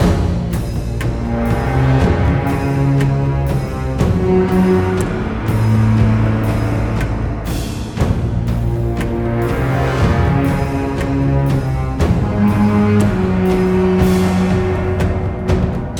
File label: String; Assassin's Creed Unity Dead Kings